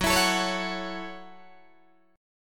F sharp Minor 7th F sharp, Guitar Open D

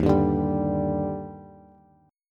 Eb Major 7th, Bass; EbM7; strummed; JGuitar

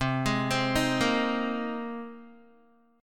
C Minor 7th Sharp 5th C Guitar; Cm7#5 arpeggiated; 8,11,10,8,10,x

